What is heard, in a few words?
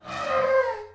elephant; 帝国时代+罗马复兴